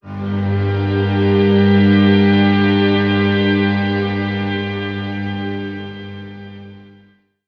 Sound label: strings one single note, Sad Loops